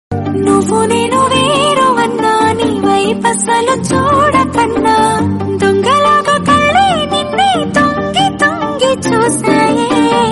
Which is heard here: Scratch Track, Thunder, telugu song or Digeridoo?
telugu song